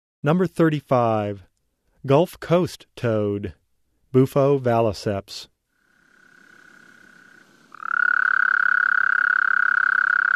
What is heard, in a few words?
Bufo valliceps - Gulf Coast Toad; Frog Calls; Herpetology; Bio227 - Herpetology